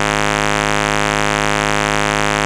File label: Bass, Root G#; Vengeance Samples